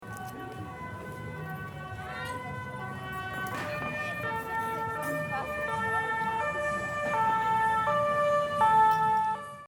Silvester Martinshorn

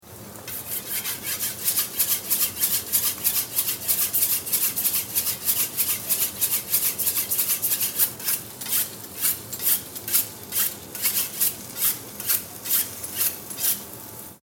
Messer wetzen Imbiss

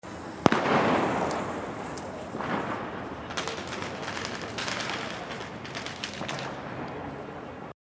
Silvester Knaller

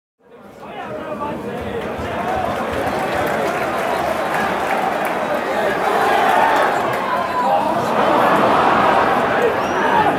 Stadion Buhh und Pfiffe

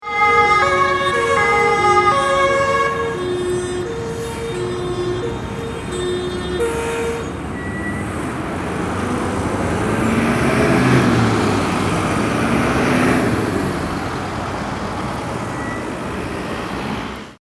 Mannschaftswagen

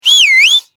vox pfiff

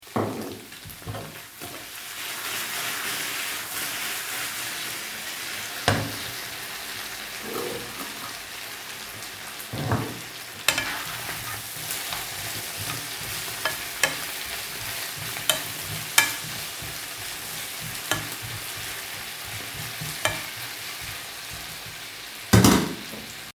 Braten

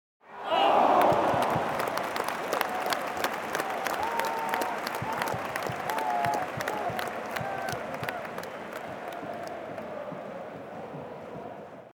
Stadion Fan und klatschen

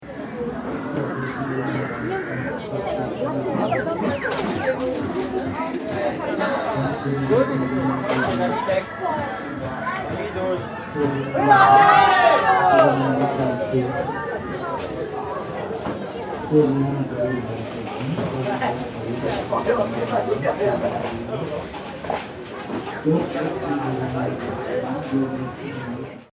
Spielbude

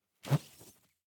Stumpfers Geraeusch

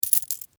Muenzen

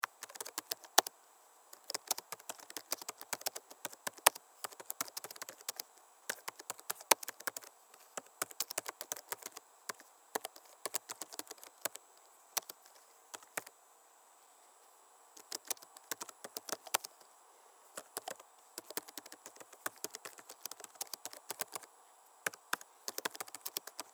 office tastatur

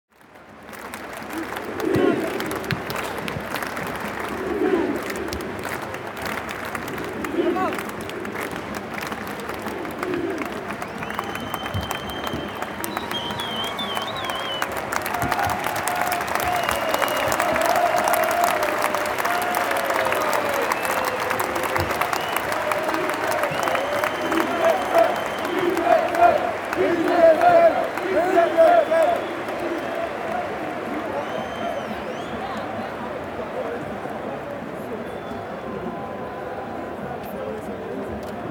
Stadion Atmosphaere

